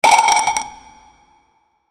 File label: item sound effect for whe, treasure